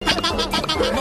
lickylicky sound

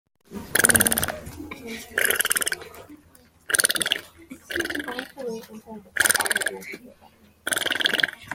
farty

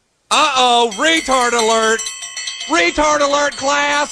r word alert

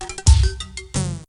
apple mac crash

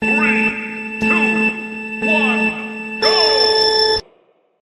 start; mario kart smash bros

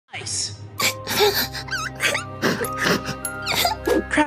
crying

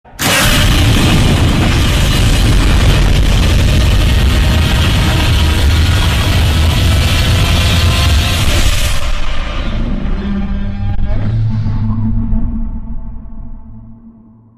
godzilla roar extended; godzilla 2014

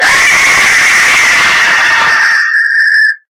scream; po; slendytubbies

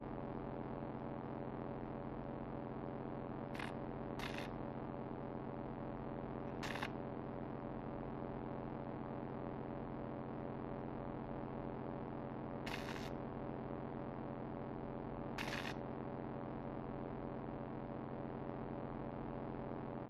island ambient; wublin

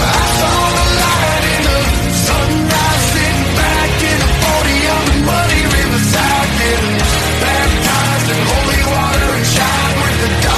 rock

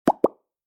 circus bubble pop

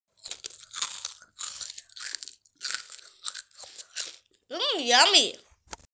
mmm yummy with crunching sound

